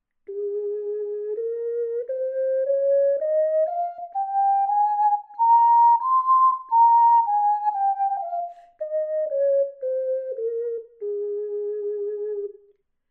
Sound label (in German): Gis Tonleiter, ocarina